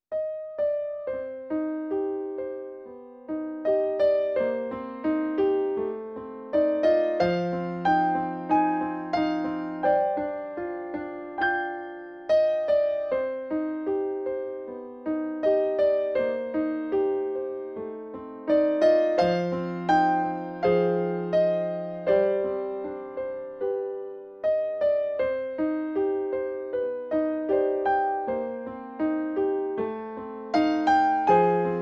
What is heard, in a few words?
Keys Only